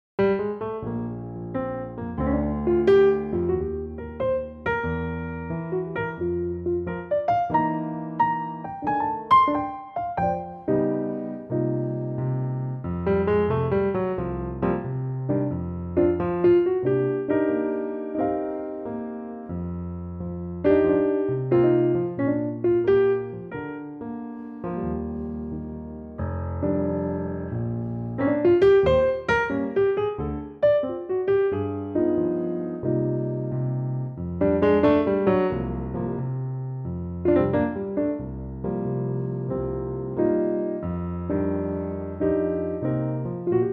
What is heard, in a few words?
Bb Piano